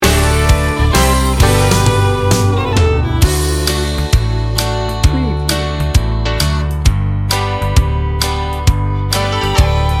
retro country feel good 60 second

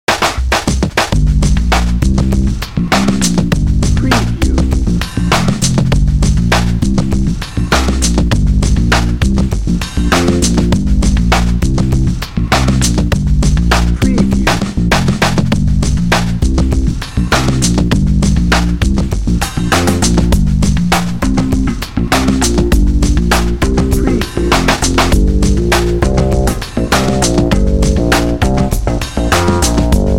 funky bass and drums

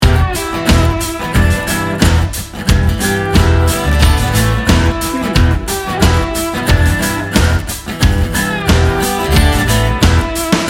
macho blues rock loop

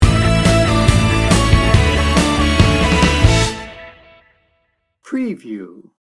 happy pop rock sting bumper

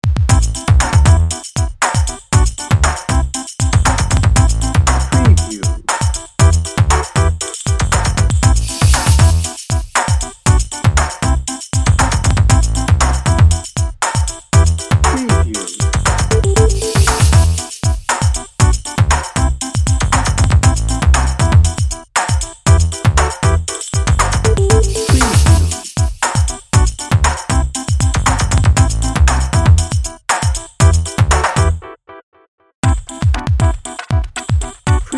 hip and groovy bed

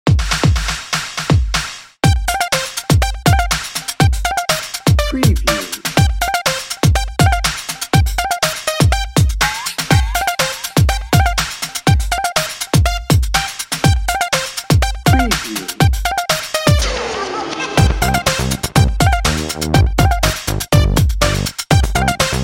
bouncy and bright

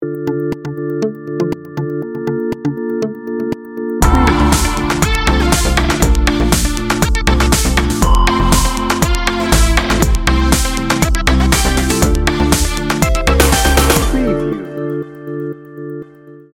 dance it out

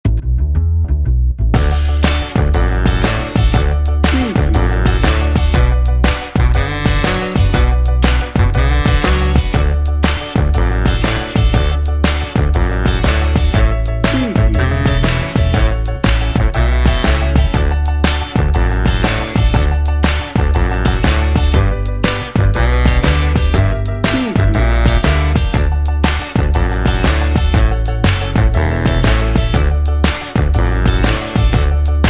upbeat cheerful 50s pop bed

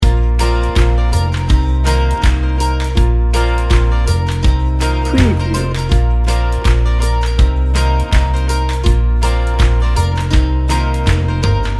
rustic heartland loop

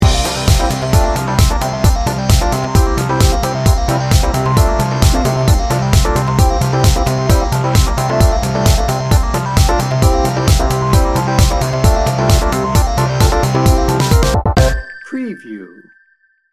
fist pumper

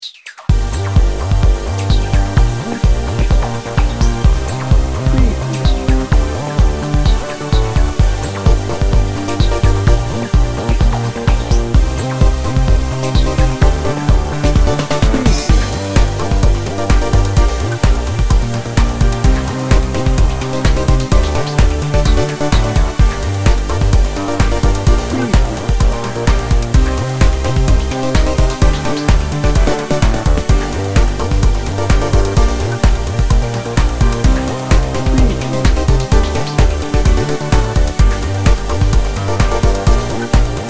hi-nrg bed

